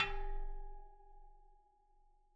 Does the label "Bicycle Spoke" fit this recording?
Yes